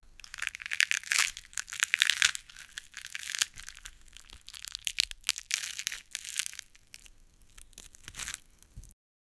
carrot crunch sound

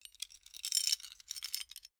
equipment

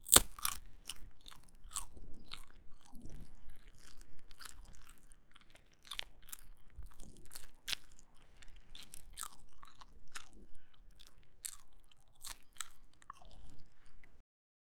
minimal soft eat noodle

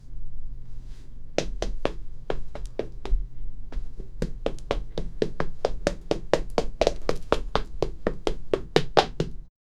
animatronic walking

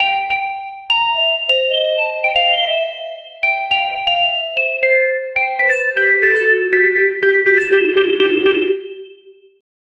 beauty melody

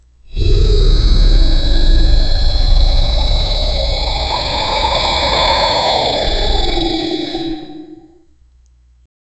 a powerful dragon lands a

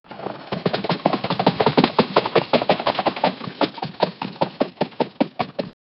the whickering of the hor; falling

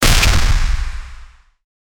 a gunfire sound

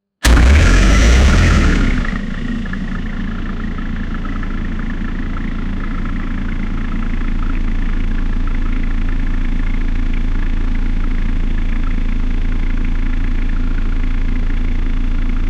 fictional diesel, cold start

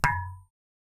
a realistic plinko ball b

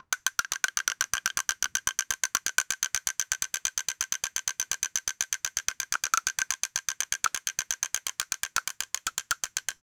fast rhythmic wooden taps; woodworking

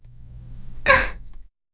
woman kiss sound; emotions

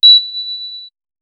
emergency button

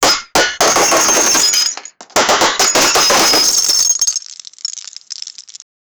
the sound of a, lego

